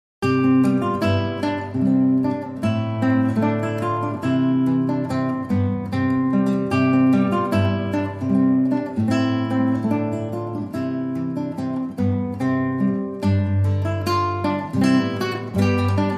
Solo Guitar

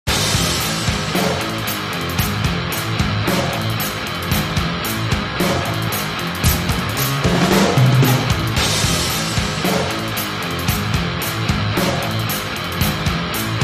Slow

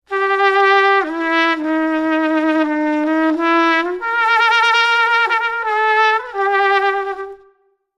Trumpet Drunk Again

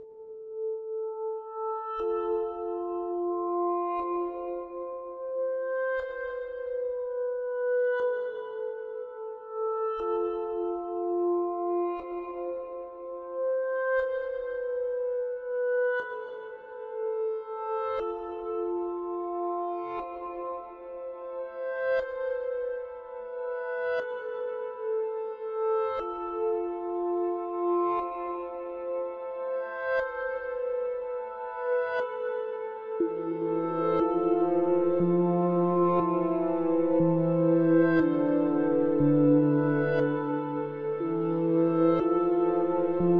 No Piano

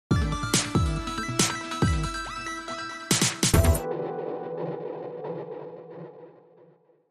8 Bit, Sting

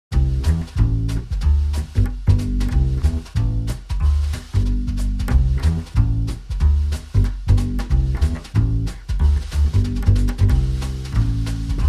Drums and Bass Only

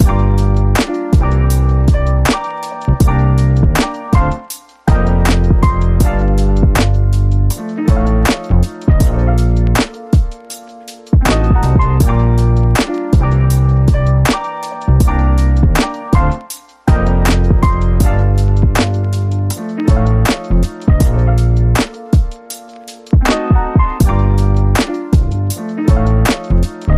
Lofi Romance Rhythm

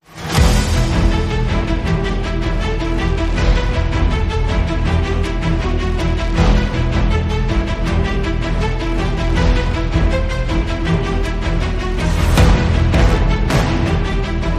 No Pulsing Guitars

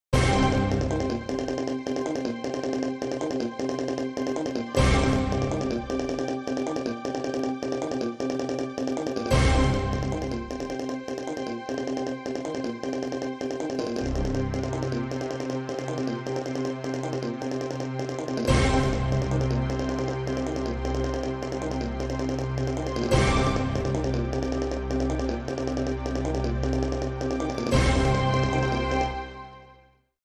Fast High Energy Action

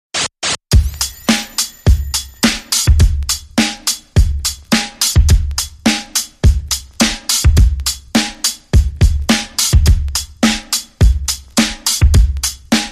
Razor Beat

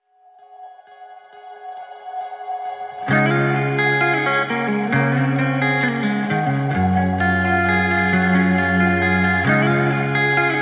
No Percussion

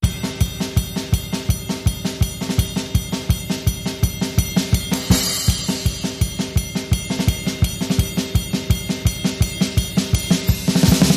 Russian Party Drumloop

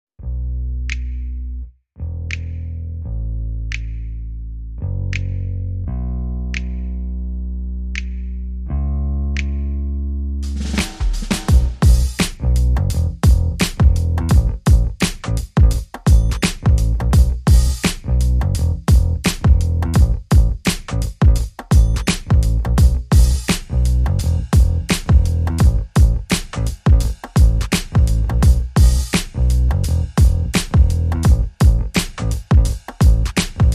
Drums and Bass Only

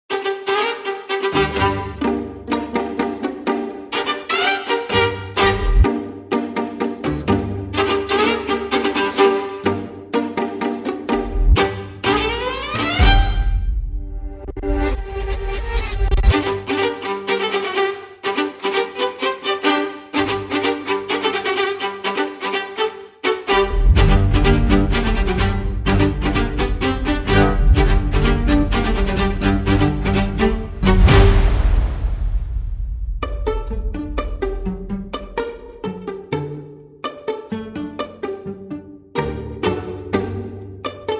No Small Percussion

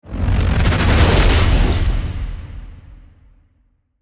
Cosmic Fire Flyby

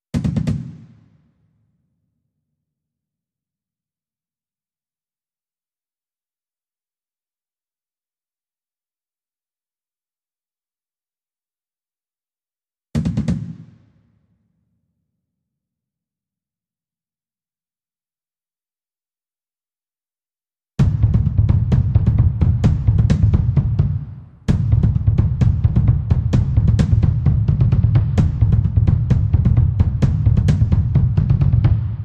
Loop Layer Bass Drum

